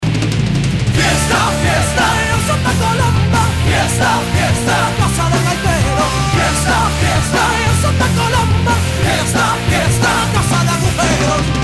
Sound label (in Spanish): Fiesta